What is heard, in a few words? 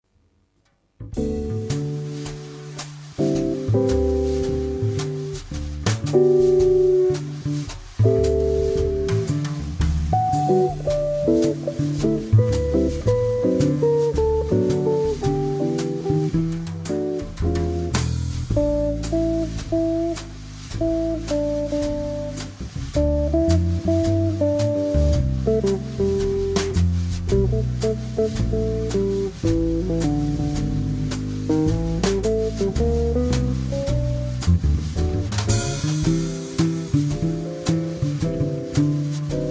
Trio brushes